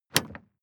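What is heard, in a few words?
sports car exterior bonnet open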